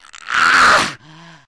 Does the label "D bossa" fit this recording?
No